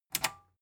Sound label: metal button tiny click disable fast